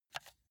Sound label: console controller arrow key press fast